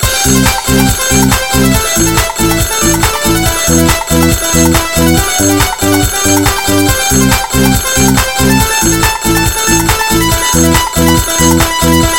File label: Trance; Nokia 1200 ringtone